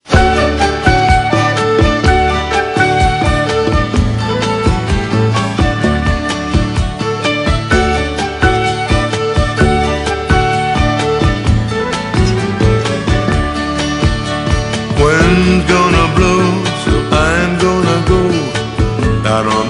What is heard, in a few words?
Blues